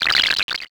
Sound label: text anim